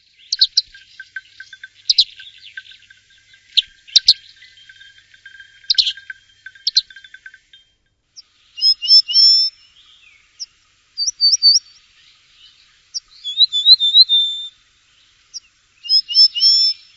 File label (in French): bruant cendrillard, oiseaux chant